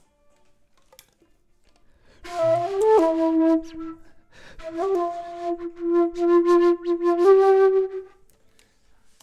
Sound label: flute